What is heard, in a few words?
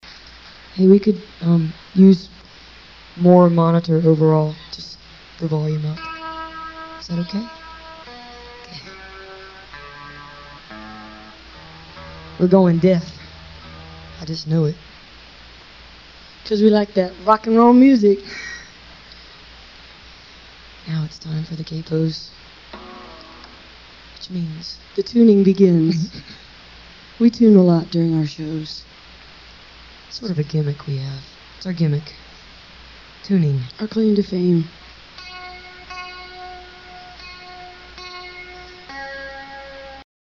tuning